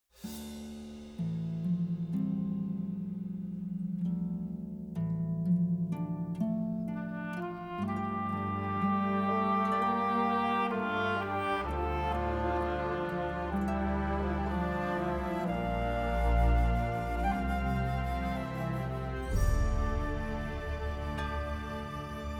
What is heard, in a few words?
Bass